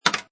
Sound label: Pinball Sound Clank With Recoil; Video Games (Sound Effects:Games)